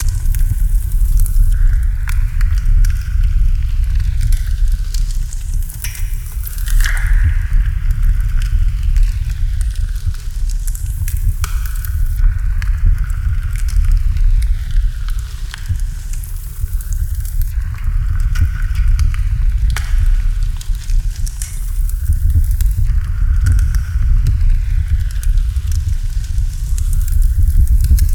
Flanger Fireplace

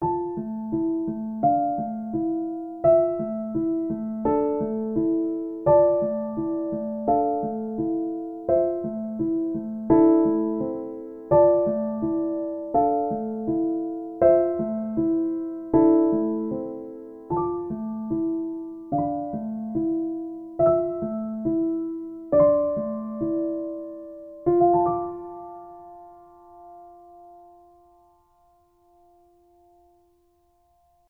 Melancholic Outro

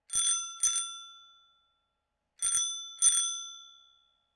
Bicycle Bell

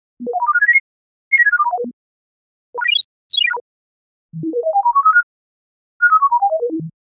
Synthesized Ringtone

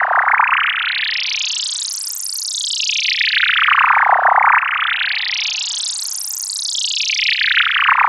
Wet Spaceship Loop

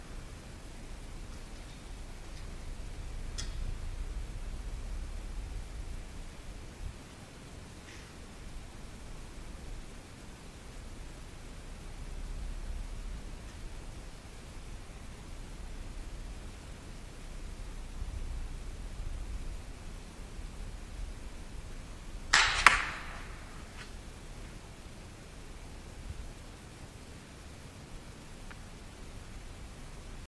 Crossbow

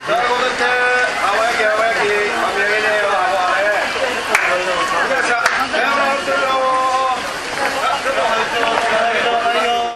Market traders

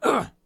Male Hurt

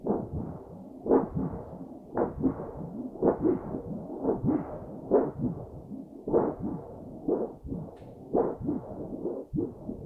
Tinman Stethoscope

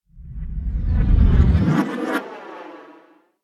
Sucking Flyby Whoosh

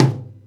Tom

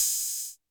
Open hat

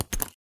Metalic Zipper Hit

